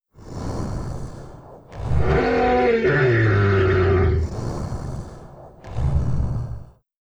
dying moan

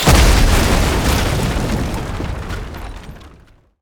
wooden ship impact

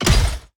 metal shield drop

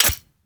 sword

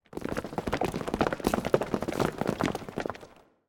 footsteps flock

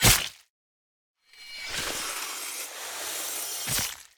dagger slow

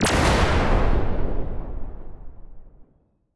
emp

